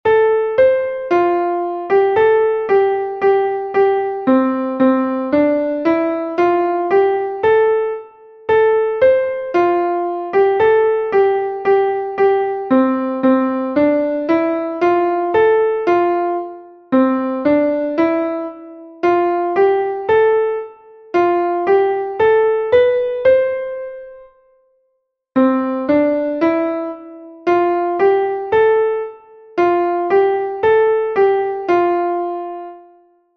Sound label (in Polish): Polka Fasolka